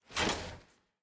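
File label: movement armor metal; Smite; Cabrakan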